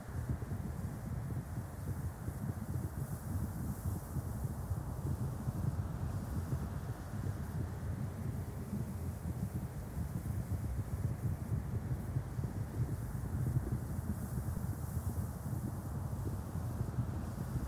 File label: Ambience Volcano Road 140bpm